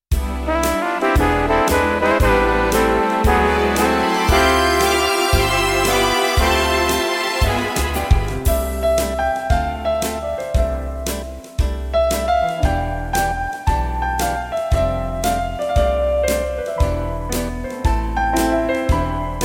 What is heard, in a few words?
foxtrot